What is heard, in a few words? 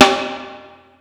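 Snare, Yamaha SY22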